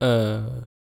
Voz tenor ord mf

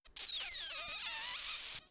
Celeste 4982hz agudo

